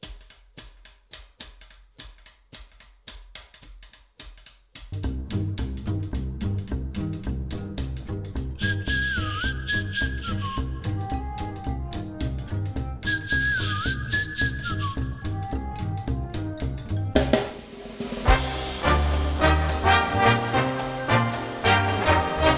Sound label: Big Jazz